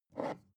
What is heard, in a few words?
glass bottle pick up